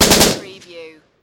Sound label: Machine Gun Sig SG5